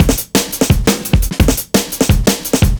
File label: Drum Loops, 172; JumpingOff; M8; Electronisounds